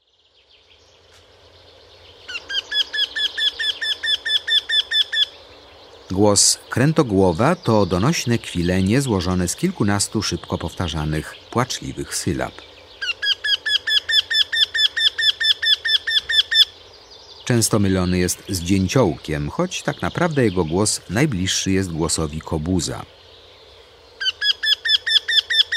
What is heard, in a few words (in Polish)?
Krętogłów, Ptaki; Kurs PTTK